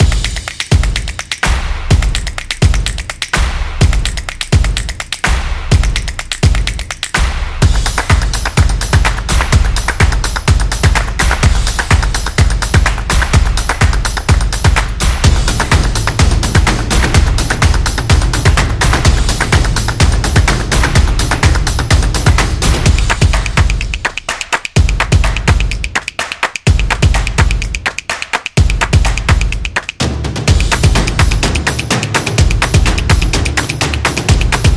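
Sport Fashion, Football percussion background music inspired by samba rhythm, stadium energy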